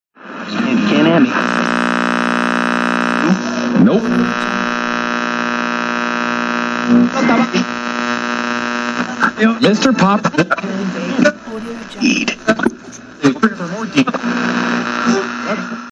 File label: radio tuning